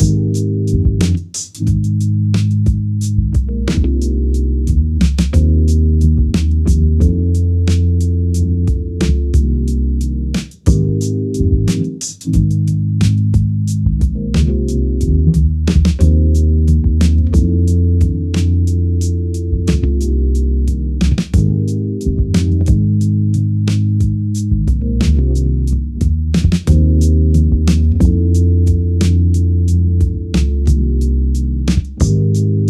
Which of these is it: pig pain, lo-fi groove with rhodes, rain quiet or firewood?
lo-fi groove with rhodes